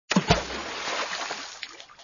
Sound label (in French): liquide plouf